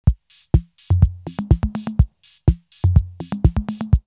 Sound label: 808 BD Break 124, drumloops, DrumMachines; X-Static Goldmine